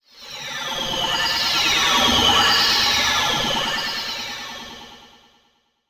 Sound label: Title Transition Data Transmission Computer; Adobe; Production Element